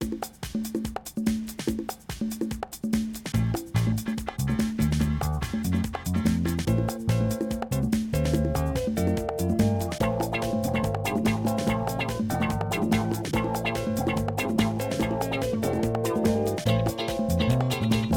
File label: Smooth club dawn